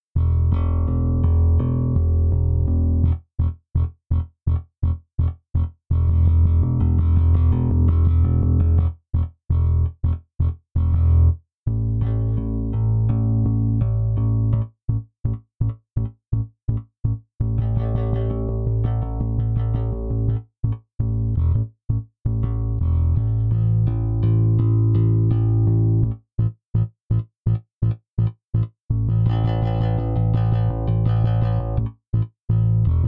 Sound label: Bass Defoult setting finger